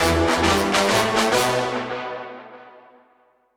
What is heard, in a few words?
Attacking Base